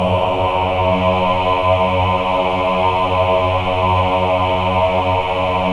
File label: men ah, Choir of Ahs; Roland - LA Composer vol. 1